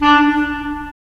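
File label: wind clarinet; Roland - LA Composer vol. 3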